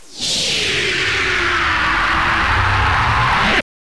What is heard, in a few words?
eyebeast death